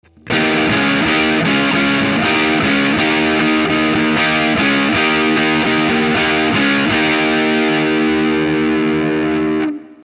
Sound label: iRig overdrive